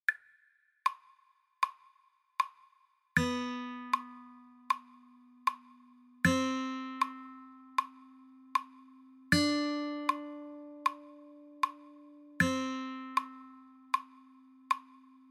2nd string B C D, guitar

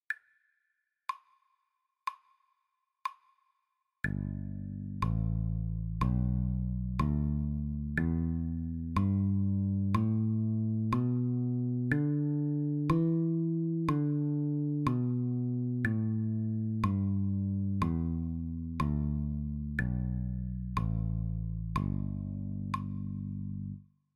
G Major Pentatonic, guitar

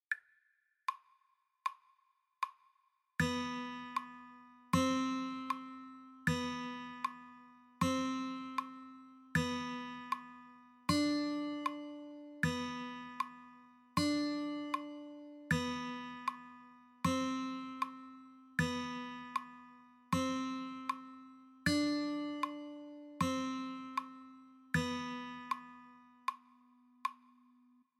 2nd string B C D, guitar

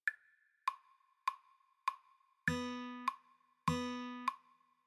rhythm intro, guitar

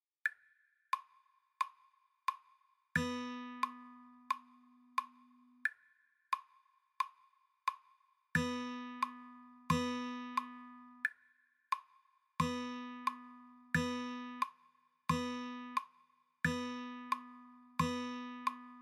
rhythm practice

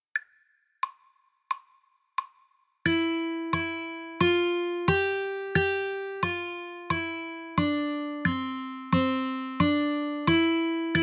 Ode To Joy Joyful fast, guitar

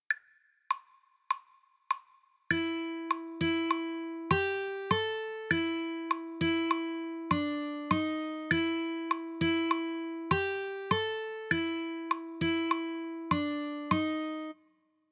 Spy Riff e

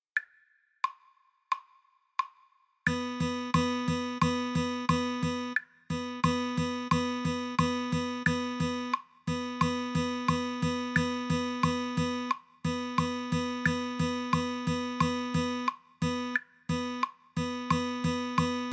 rhythm practice fast, guitar

